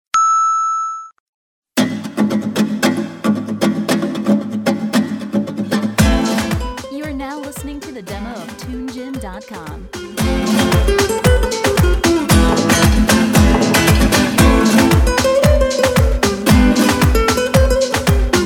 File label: Flamenco; Tunegym